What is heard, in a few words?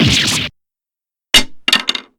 damage; nayna